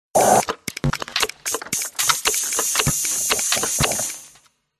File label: science fiction steam punk machine operate clicks gears turn; Audio Mangler